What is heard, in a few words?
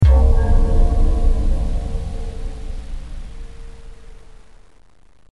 shredding note; zps